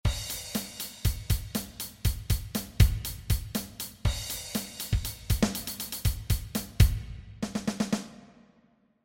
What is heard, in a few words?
drumbeat